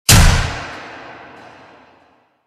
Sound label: lights sound effect